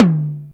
tome drum sample d key; Duration 0:01; Samplerate 44100 Hz; Bitdepth 24

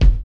sharp kick drum single shot F key; tuned drums f key

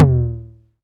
low mid tom drum sound a sharp key

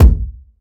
small reverb kick drum one shot b key

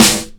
perfect acoustic snare A key; Duration 0:01

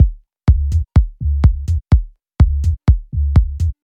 tech house rhythm kick; drum loops

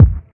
dry bass drum one shot f key; kick drum samples

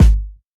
rich low end bass drum sound g sharp key

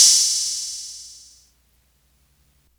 large room crash f sharp key

